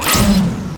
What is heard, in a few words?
Laser Slug, weapons